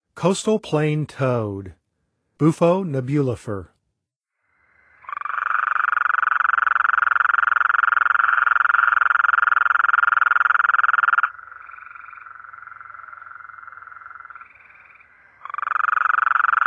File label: Coastal Plain Toad; North America; Songs of Frogs & Toads of North America